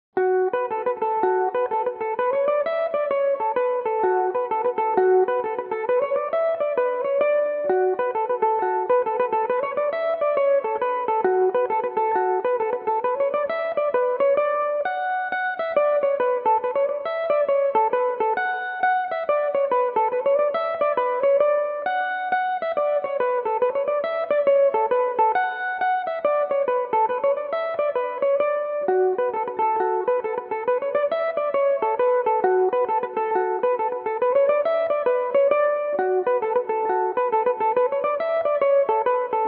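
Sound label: D major belmuse, irish mandolin; maulykeavane rambler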